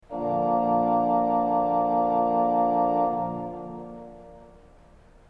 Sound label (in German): ton, orgel